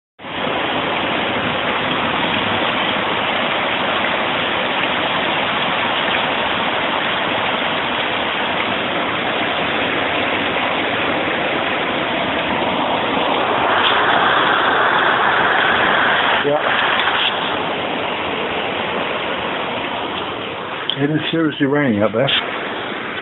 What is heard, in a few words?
pouring with rain